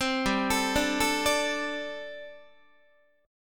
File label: G Suspended 2nd Suspended 4th, Guitar